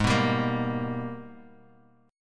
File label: Minor Major 9th, Guitar, Standard; G#mM9 strummed; 4,2,5,3,x,x